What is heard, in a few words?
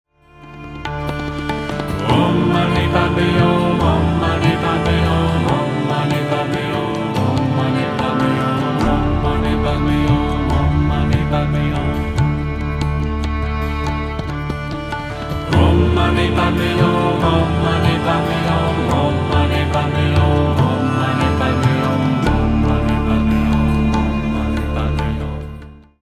Om Mani Padme Hum